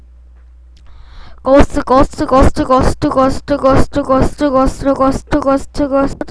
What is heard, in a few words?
ghost